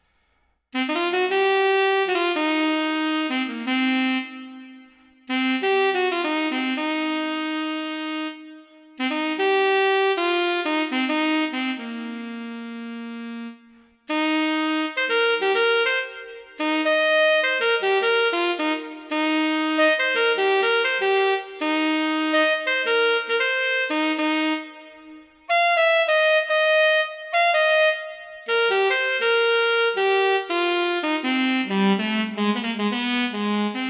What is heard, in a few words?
brass alto sax